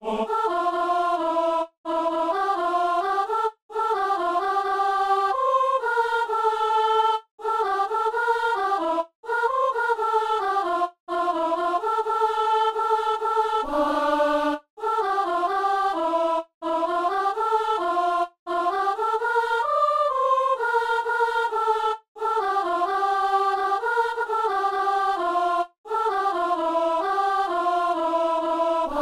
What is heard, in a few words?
soprano; Messe du 17 janvier à Saint-Denis